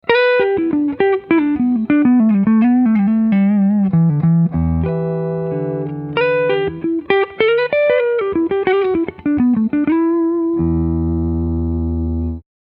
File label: epiphone sheraton ii neck clean